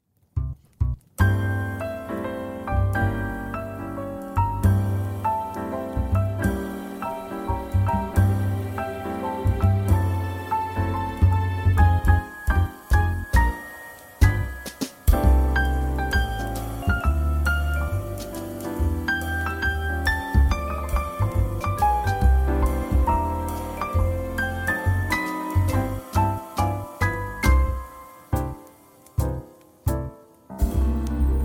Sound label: Christmas Jazz; scriptnotes